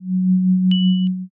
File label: final tension